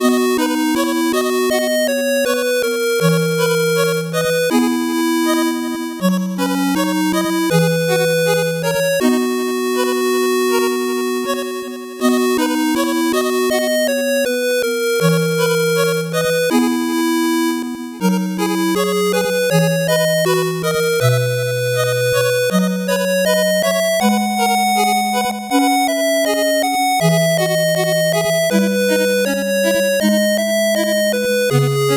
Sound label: 8bit bgm slow